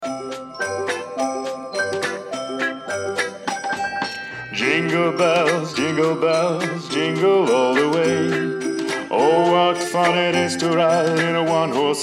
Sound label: Jingle Bells happy version